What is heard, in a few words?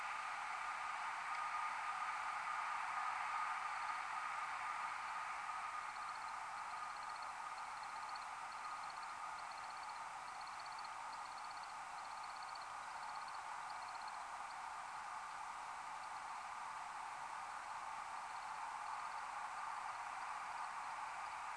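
American Woodcock display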